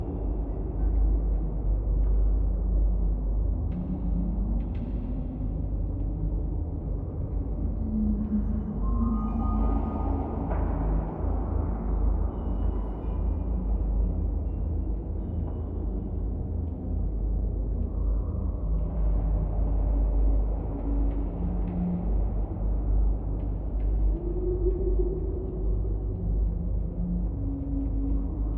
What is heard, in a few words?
amb